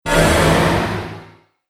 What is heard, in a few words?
Synthetic Crash Sound, impact